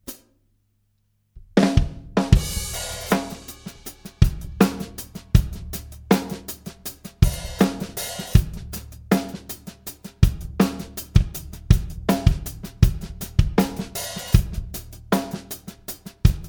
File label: Drum Test dreamverb and comp